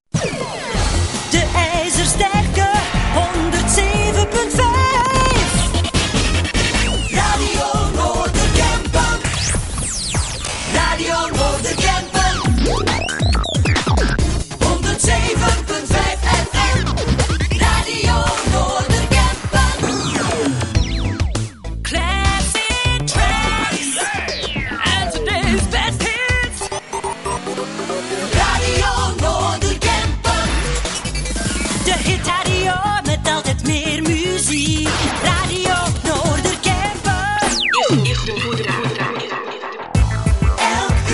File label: Jingles